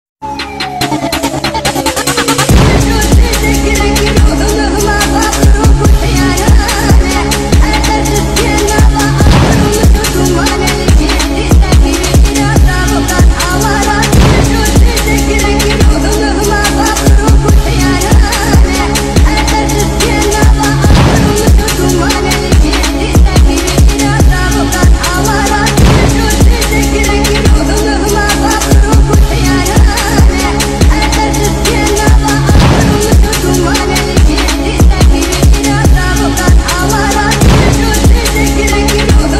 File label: trap